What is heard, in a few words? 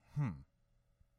bad hmph; angband